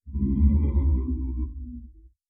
zombie pain

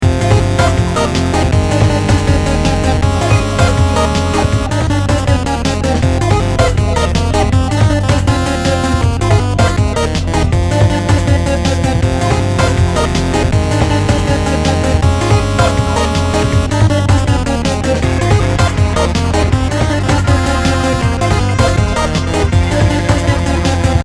CPU Showdown Extra Short Loop

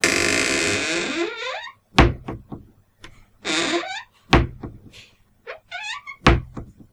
creaky light wooden door

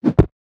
Mallet

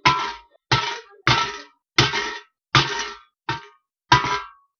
Metal sounds

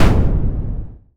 synthetic explosion

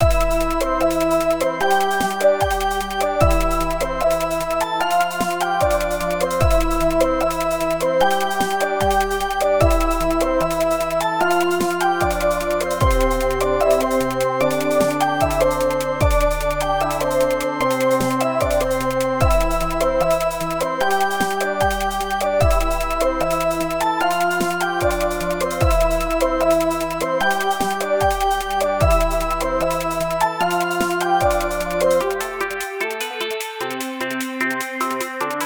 west winds storm version

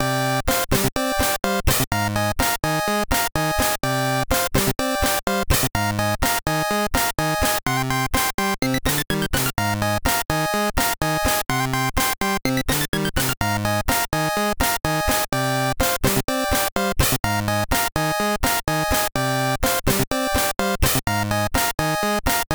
c64 style; the spanish ninja

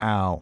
pain

